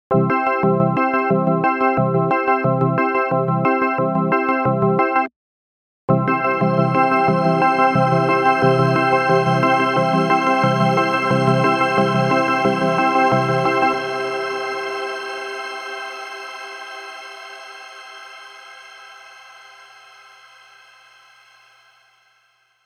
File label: Shimmer Organ